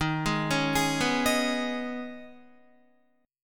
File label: Eb 7th Suspended 4th Sharp 5th, Guitar; arpeggiated; chord